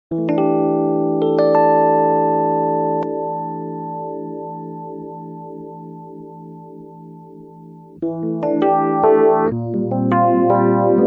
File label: rhodes small stone